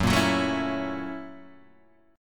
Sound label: F sharp 7th Sharp 9th, Guitar, Open G